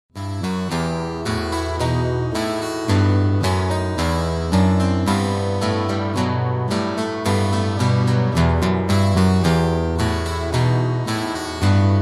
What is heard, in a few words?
Bouree